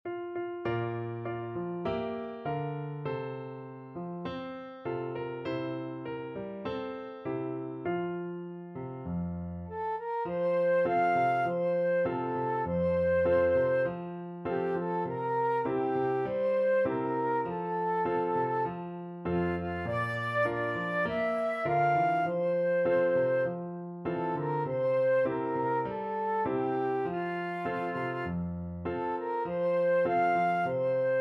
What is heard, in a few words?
balonku ada lima flute